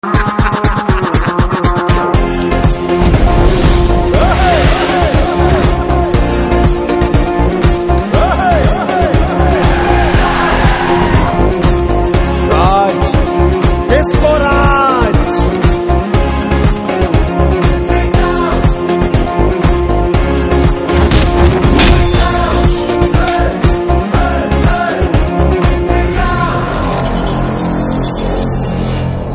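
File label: background music Telugu Ringtone; Disco Raja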